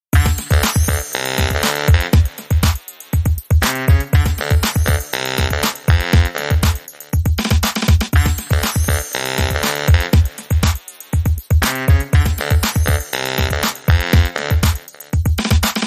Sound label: The Baritone